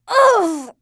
hurt; TrueCrimeStreetsOfLA; XBOX

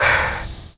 pause exit; Tony Hawk's Project 8; XBOX

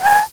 skid; Matt Hoffman's Pro BMX 2; XBOX

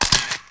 Camera

